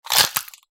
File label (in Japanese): 骨が折れる音